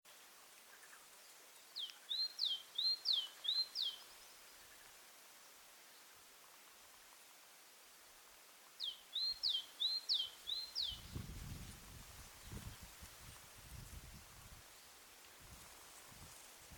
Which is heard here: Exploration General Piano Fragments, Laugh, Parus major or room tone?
Parus major